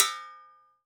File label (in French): casserole; In the kitchen; 96khz 24b mono